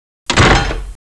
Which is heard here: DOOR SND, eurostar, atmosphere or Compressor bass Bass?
DOOR SND